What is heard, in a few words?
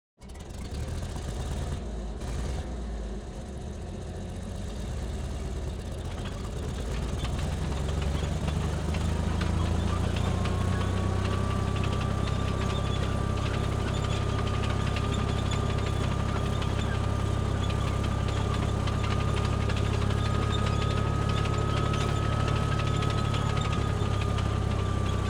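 Hetzer Tank, Onboard, Start, Reverse, Exhaust, Rod; Jagdpanzer 38(t) Hetzer 1944; Pole Position; RE20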